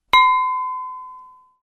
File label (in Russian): bokal